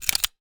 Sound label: Reloading; 完善武器音效; DungeonShooting; Godot